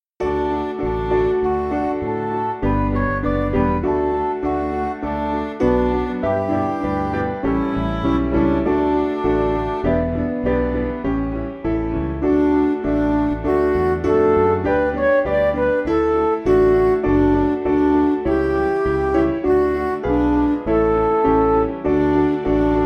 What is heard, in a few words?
Music Backing Files for Churches